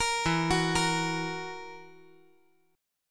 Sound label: E Suspended 2nd Flat 5th E down